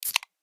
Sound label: item soda can pickup